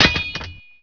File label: gib metal a, gibs; quakemapping